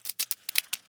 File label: Glitch